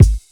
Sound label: KICK, Hip Hop